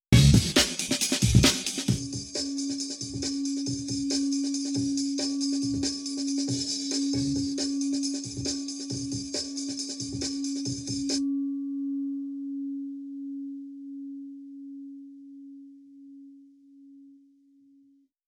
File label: bowl dry Wet; schoeps